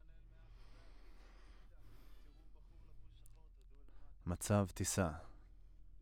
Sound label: Voc OD